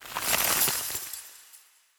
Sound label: Ice